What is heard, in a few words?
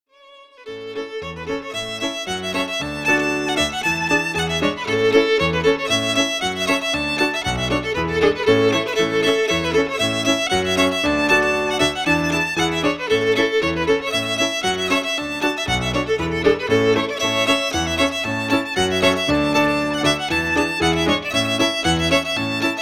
Reels